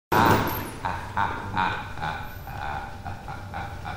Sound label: Short Laugh; Joker